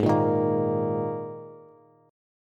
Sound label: Ab Suspended 2nd chord, Bass; strummed; 16,13,13,15; JGuitar